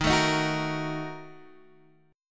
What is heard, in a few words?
A sharp Major 7th Suspended 4th Sharp 5th, guitar